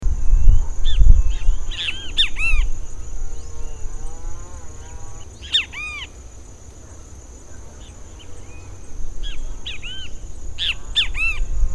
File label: Pitangus sulphuratus Bem te vi